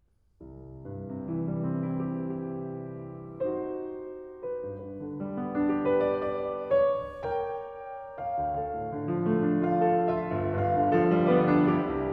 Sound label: Melody, Piano; Lyric Pieces, Op. 38; Grieg